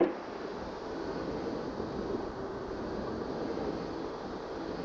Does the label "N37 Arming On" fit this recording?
Yes